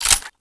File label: galil clip in, weapons; cstrike